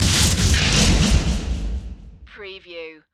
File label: Stinger; Sound Effects Bible